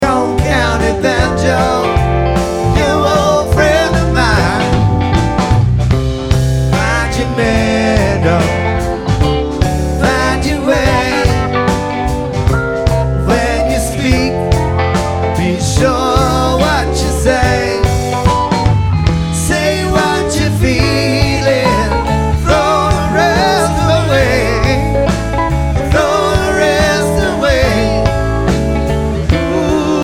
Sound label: Banjo